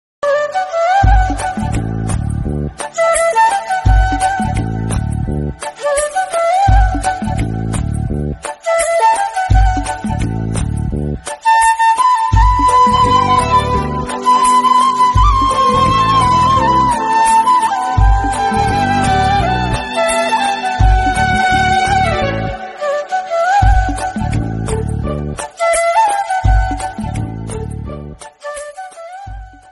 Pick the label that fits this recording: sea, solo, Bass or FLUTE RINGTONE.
FLUTE RINGTONE